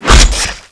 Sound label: lance slash hit